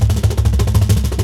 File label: WORLD DRUMS, MIXED DRUMS; WORLD INSTR 2